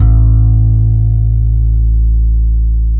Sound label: bass F; Zero-G; Chemical Beats; BASSES